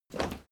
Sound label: step crowd run quiet single left; schritte